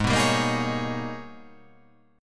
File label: Ab Minor Major 11th, AbmM11 chord; strummed; JGuitar